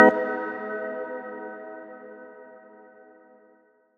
Diner Organ; Big Fish Audio; OSH Chords

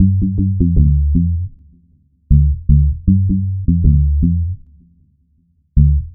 Dub Bass, Electric Bass; Dub Bass Strategies; Sonic Foundry (Sony Creative Software); Leo Cavallo Bass Taster

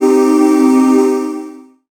pad, Dance Synths; Break Pads

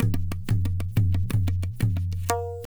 Pure Tabla, 090BPM; Zero-G

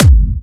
Clubby Kicks, Bassdrums

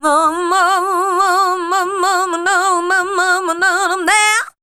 RHY LINE, Voice; Best Service; Voice Spectral II

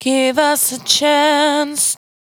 92bpm Bb, Velvet Vocal; Zero-G

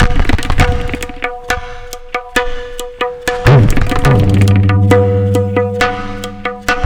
Tabla, 140 BPM; Zero-G; Pure Tabla

